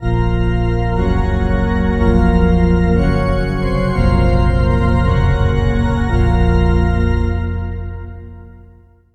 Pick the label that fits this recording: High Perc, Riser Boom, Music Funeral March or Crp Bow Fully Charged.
Music Funeral March